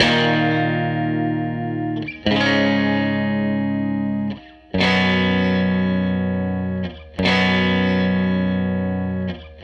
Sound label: Strat Guitar Loop